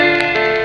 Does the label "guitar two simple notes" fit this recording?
Yes